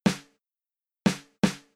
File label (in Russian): snare, Ритмическая фигура малого барабана 2